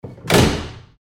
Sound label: door close